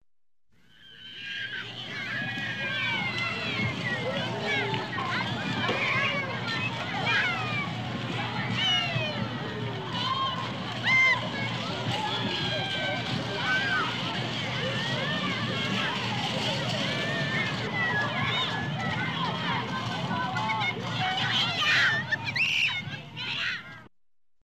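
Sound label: skateboard children riding on the sidewalk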